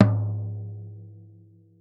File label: floor